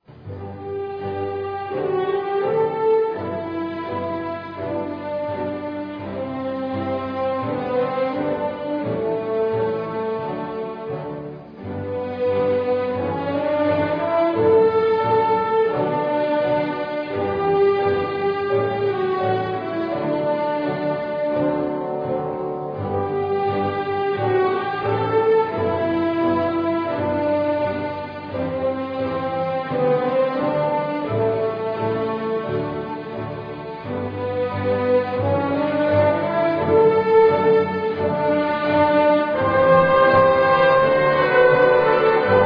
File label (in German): Marsch; Pomp-und-Ruestungs-Marsch Nr. 1